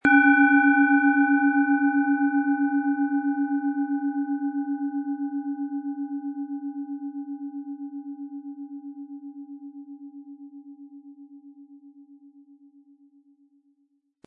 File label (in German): Planetenschale